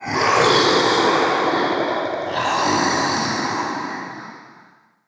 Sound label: Mockery Male Vocal Idle; Enemy; Mockeries